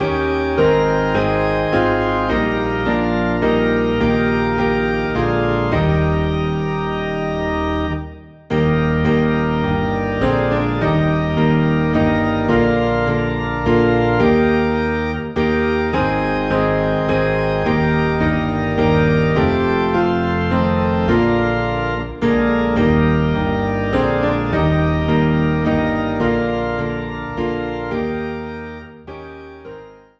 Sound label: Piano and Organ Low in E Flat